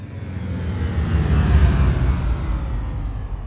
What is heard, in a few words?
ship descend; CosmicRage; ships movement